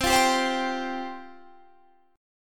C Suspended 4th C

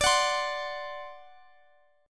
C Suspended 2nd Flat 5th D

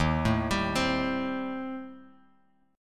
Eb 6th Flat 5th Eb, Bass Standard; Eb6b5 arpeggiated; 11,12,17,17; JGuitar

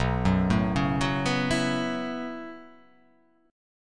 B 7th Suspended 2nd Suspended 4th B down

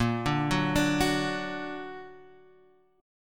Bb Augmented Bb down Guitar Half Step Down

